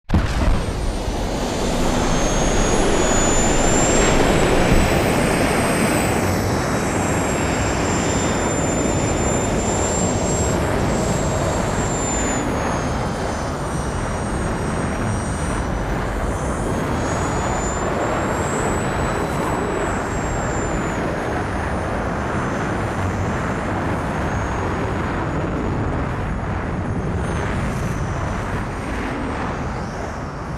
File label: small ship takeoff; Titanfall 2